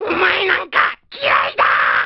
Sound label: mouth beam charge